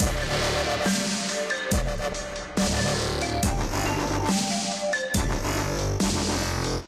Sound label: ICE CREAM DUBSTEP; The LEGO Ninjago Movie Video Game; PS4; Windows; Xbox One